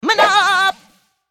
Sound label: Yellow Support; Character Yellow Support